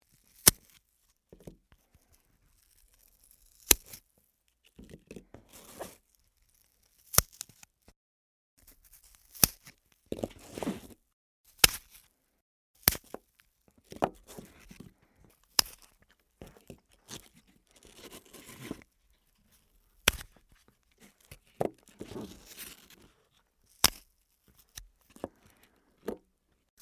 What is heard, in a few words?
apple pear split